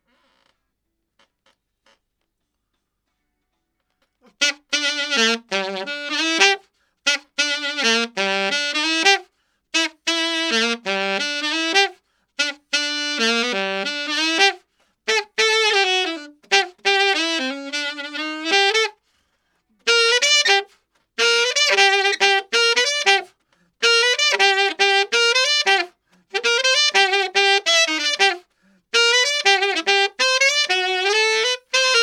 rocknroll sax

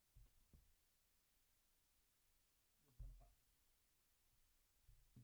BD Out

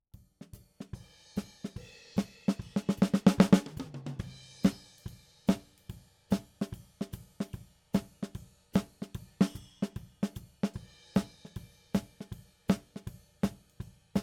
SNR top

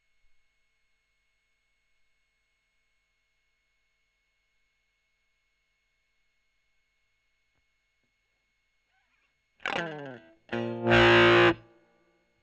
E.GTR Gefell OD

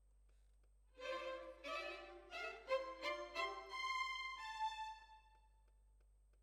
Violins, jazz for piano

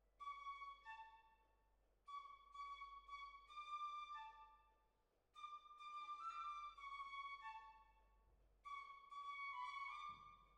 Hall

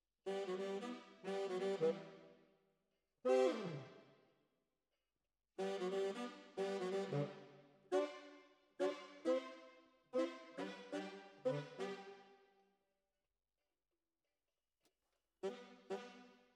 Bari, RNR for piano

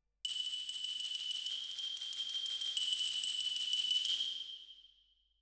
Twin R Xylo

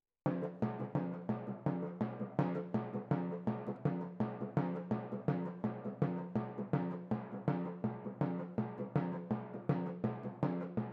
Timp Close

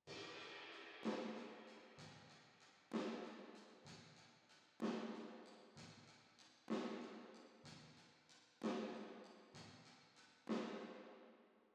Drums Verb

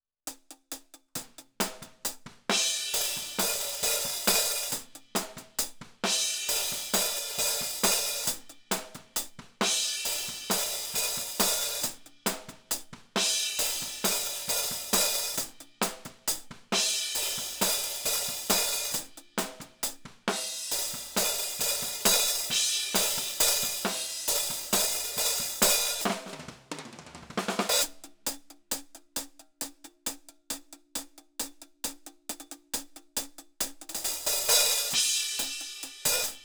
Hi Hat

